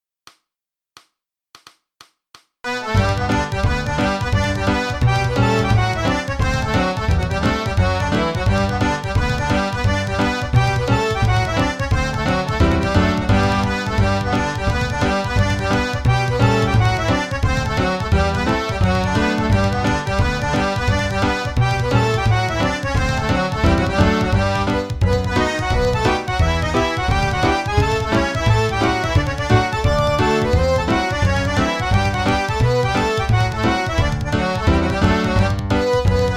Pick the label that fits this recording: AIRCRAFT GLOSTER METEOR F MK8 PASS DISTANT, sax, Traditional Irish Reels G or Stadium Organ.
Traditional Irish Reels G